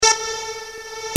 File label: Hardcor